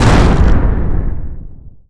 Bullet Deck Cannon Hit

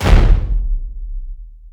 Small Cannon Hit

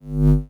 Disruptor Charge

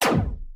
Laser Blaster Shoot

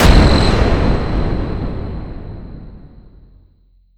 Reactor Core Destroyed

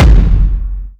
Large Cannon Shoot

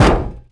Chaingun Hit

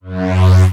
Shield Generator Activate

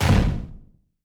Small Cannon Hit Structure

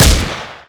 Chaingun Shoot

